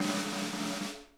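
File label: snare roll; Sonic Reality; Interactive Drum Kits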